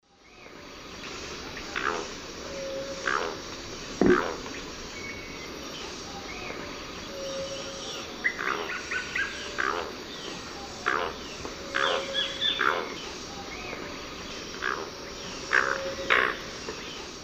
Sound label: Selenidera reinwardtii, song; S. America; Eastern Lowlands